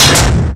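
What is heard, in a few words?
Boss DR-202 percussion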